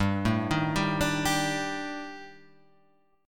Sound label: Eb Major 7th Flat 5th G